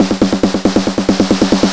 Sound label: snare roll 138, snare-rolls, Techno; Techno ID; drum-loops